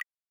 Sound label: button